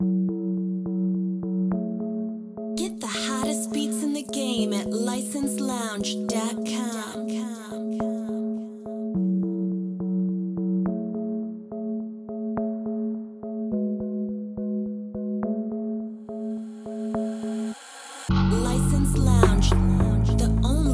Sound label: Mixed 105bpm